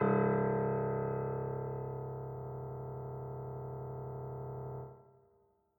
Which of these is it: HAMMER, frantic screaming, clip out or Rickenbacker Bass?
HAMMER